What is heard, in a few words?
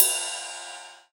jazz ride; osu